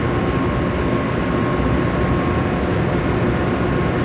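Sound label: ambience machine; tank farm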